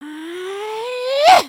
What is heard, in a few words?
ninja build forward, emotes